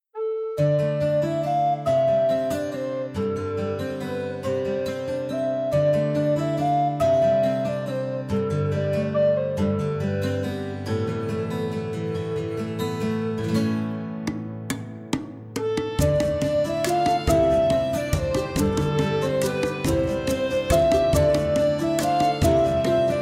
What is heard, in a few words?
Relaxing day at the Tavern; Pirates Outlaws